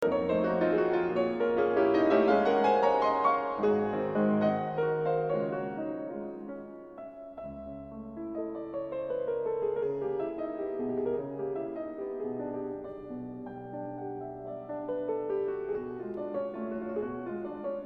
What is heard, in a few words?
Impromptu G flat major